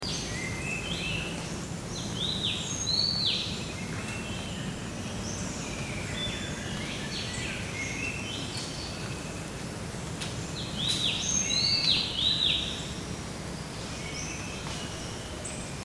Elegant Tit; Makiling

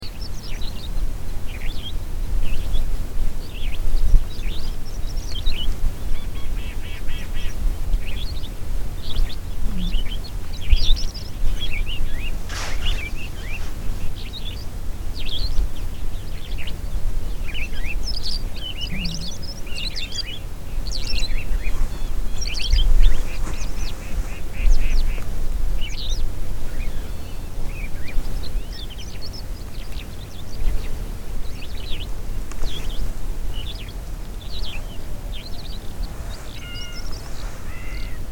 Tibetan Lark, birds; Ruoergai Sichuan; China

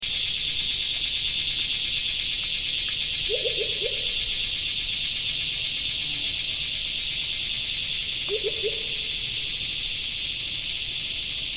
Black faced Coucal, Bohol

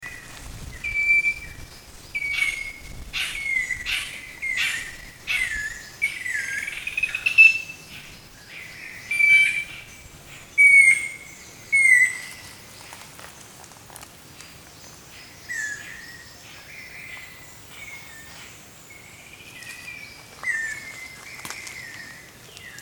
Spotted Wood Kingfisher; Makiling

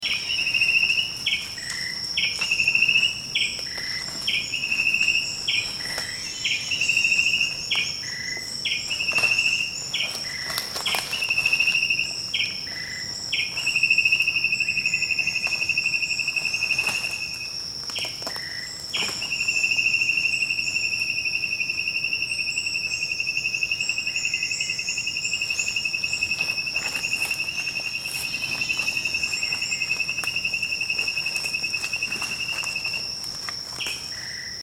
Grey backed Tailorbird; Makiling

